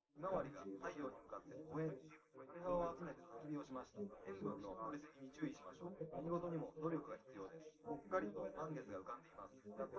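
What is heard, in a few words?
male, live recording 250ms 5cm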